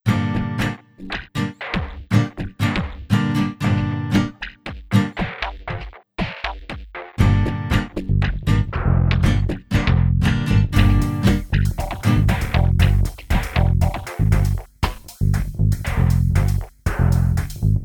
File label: Weird Beat